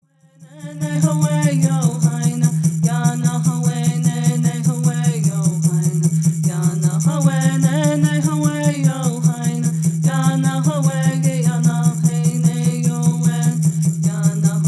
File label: Peyote Song